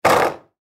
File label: Foosball Table; Foosball Table Player Goalie Spin Hard Short; Sports